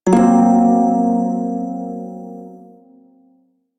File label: Prime Shawzin Blues Chord J; Music; Warframe